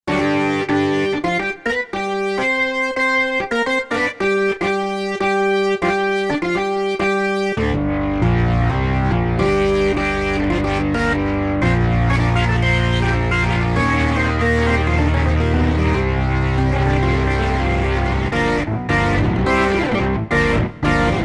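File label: Distorted Organ Split; Roland SC-880; Factory Patches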